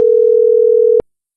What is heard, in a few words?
outgoing Ringing; jiti meet